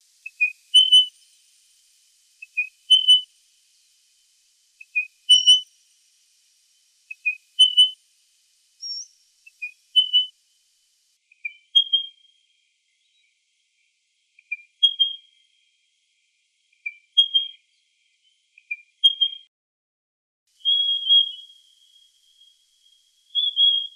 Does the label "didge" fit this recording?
No